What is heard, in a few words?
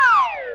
descending slide whistle